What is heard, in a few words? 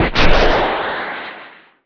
ORNITHOPTER ROCKET; Emperor - Battle for Dune